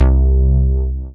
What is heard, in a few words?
dance bass; Sound & Vision - Gigapack II CD 1